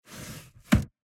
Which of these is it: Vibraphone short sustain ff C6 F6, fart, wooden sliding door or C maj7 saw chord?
wooden sliding door